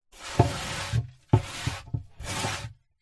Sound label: foley mud dirt dusting on wooden box scrape off by hand internal pov coffin